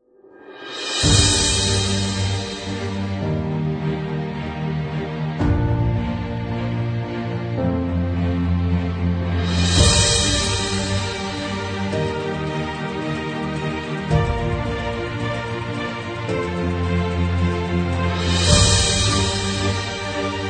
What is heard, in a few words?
Epic No Solo